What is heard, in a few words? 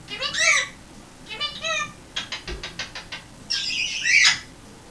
give me kiss